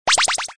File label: Double Box Sound